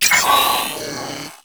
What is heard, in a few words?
Cannon Hack Start; Makvoss Cannon